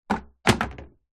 household plastic table set down or knock over wood balcony; zapsplat